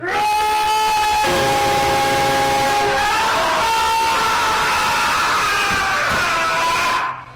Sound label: screaming in public restroom prank; goku